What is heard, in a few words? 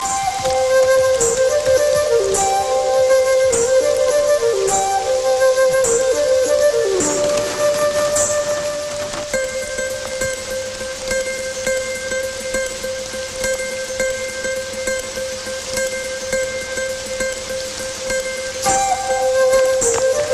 Love Flute